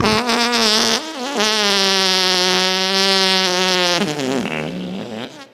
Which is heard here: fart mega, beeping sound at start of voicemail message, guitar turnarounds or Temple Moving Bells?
fart mega